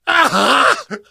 hurt major, survivor voice

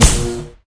batsaber hit world; tf

